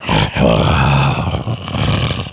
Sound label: zombie pain; svencoop; hunger; hungerzombiecop